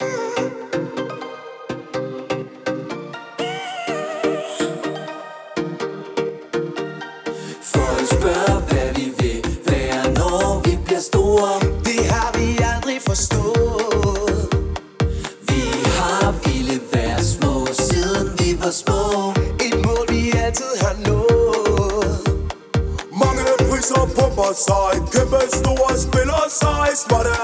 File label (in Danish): telefon mix